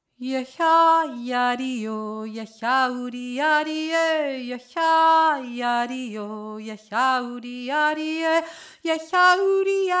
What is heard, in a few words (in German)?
oane stimm